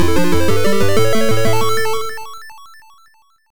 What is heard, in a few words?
Level Complete; Turrican 2; Atari ST